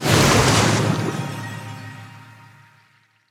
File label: Water Spell; AudioWizards